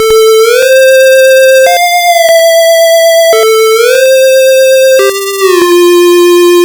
Game Boy Synth

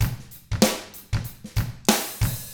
95 FUNK, Drums; ILIO; Double Platinum Drums 1

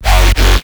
Wow wuw F, bass

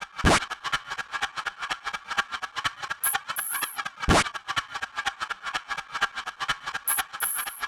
Sh Fx Loop 125; Equipped Music; Loungin House

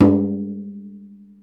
MalaiyuDrm

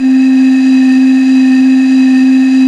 BOTTLE BLOW

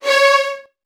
C#2, Strings, VN 16TH; ILIO; Kirk Hunter

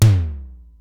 Simmons SDS-9, drum machine

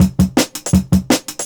Jungle Breaks; 90s Sample CDs; Original Jungle Breaks

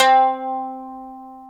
M. KOTO C3 B, ETHNIC; AKAI S-Series CD-ROM Sound Library VOL-8

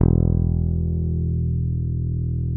P BASS SUSTN, E1; Sampleheads; Will Lee Bass Library

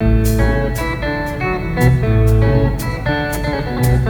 World Lick; Sonic Foundry (Sony Creative Software); Ambient Grooves; World Licks

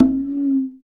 percussion congas

